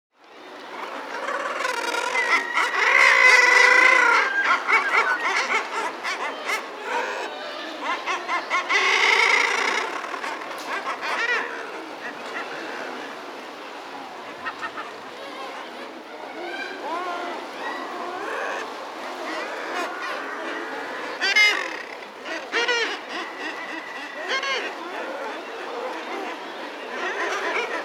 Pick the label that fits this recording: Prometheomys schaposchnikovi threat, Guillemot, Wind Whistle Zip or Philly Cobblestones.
Guillemot